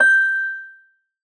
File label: soft hit whistle; osu!